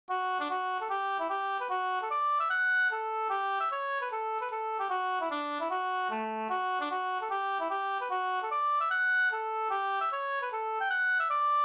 schottis Ddur; e Bror Strand Urshult Sm

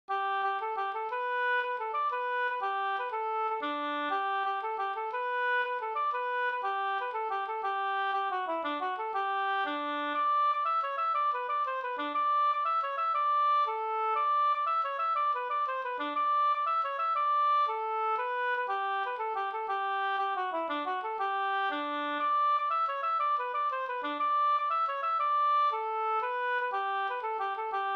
halling; fr Valdres Fjeldm2u009

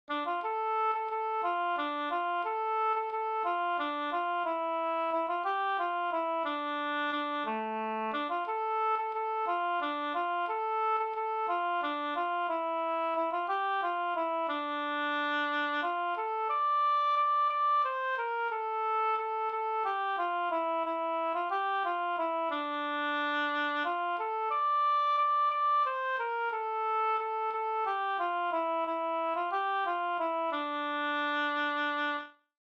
vals dmoll

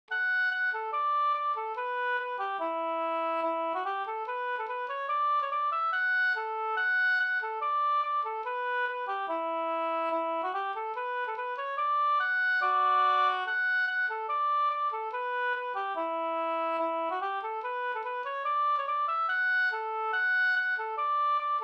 schottis; e Axel Sjolander Sm

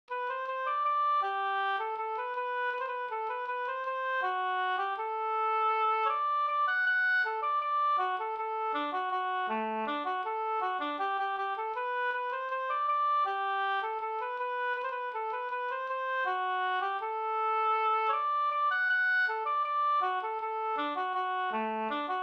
ganglat G dur

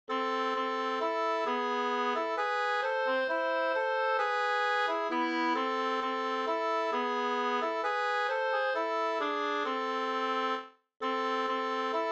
vismelodi langdans; En gang i bredd

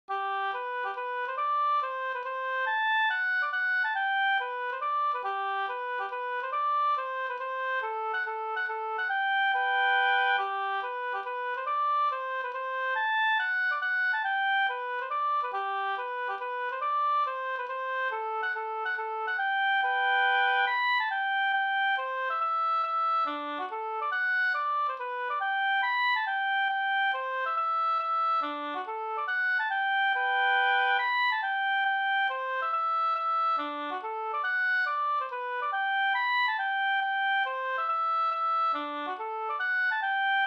hambopolska

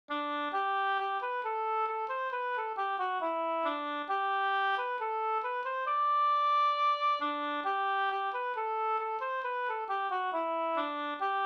ganglat G dur

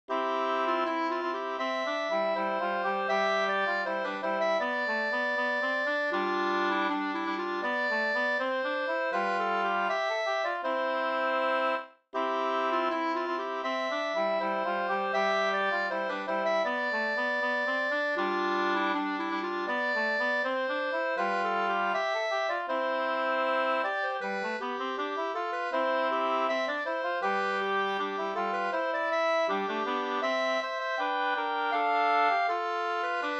brudmarsch; e Carl Erik i Lind

